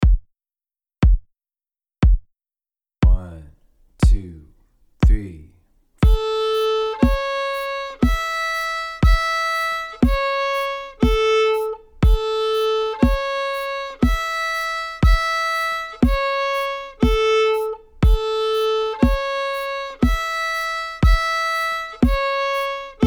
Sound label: triads, fiddle; scale central